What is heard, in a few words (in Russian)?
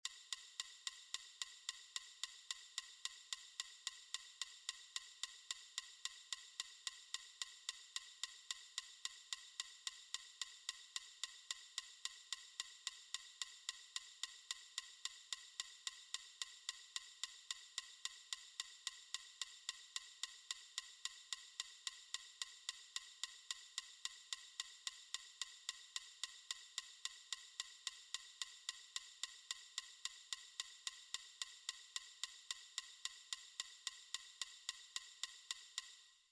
метроном 220